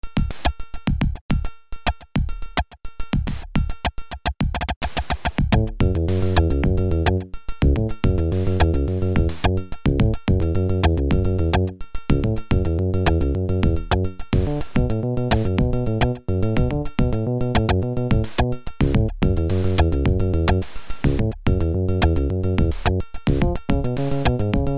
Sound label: Castle Ruins